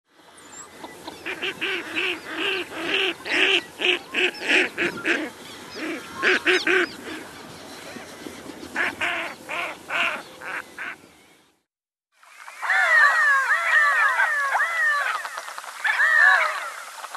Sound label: Redhead